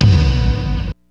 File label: RAT-KIK; Rubber Chicken Software Sample Bank